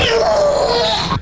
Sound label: puke sound